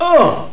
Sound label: UUH; Carmageddon Demo 1.0